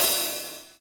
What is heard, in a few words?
Hat Open Softest; hydrogen drum samples